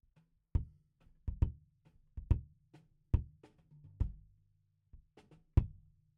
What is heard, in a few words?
Kick In